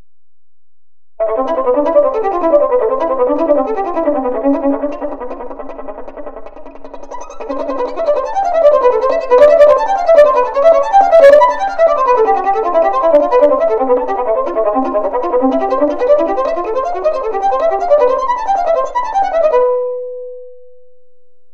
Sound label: Sautille varying